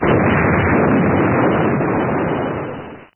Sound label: explosion; deliantra